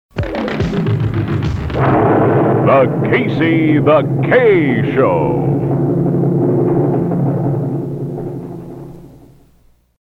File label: Drums and Explosion